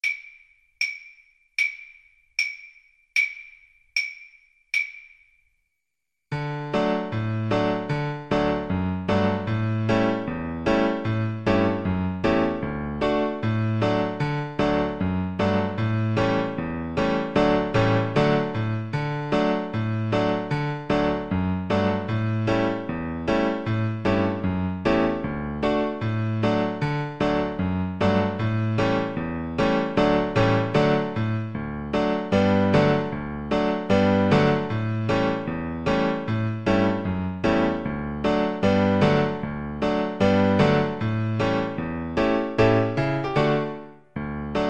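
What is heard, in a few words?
karaoke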